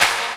CLAPS HOU; Best Service Dance Mega Drums

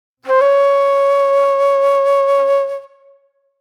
Mellow Flute

